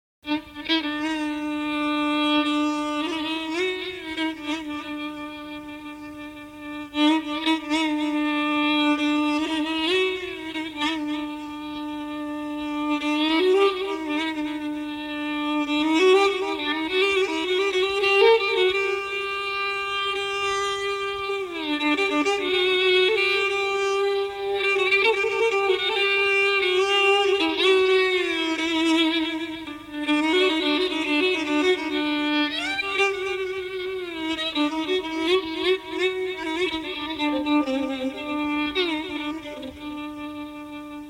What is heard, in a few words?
Saz